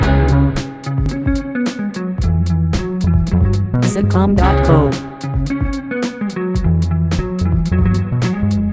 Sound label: funky